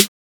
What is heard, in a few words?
Open Snares